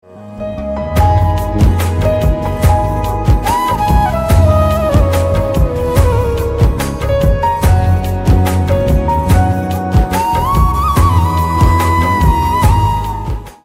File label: BGM Piano Ringtone